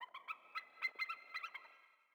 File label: sting eagle; Rust